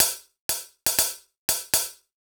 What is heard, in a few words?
hihat rhythm